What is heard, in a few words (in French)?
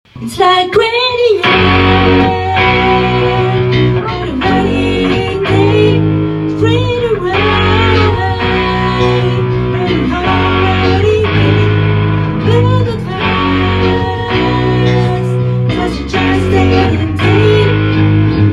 Travail Chant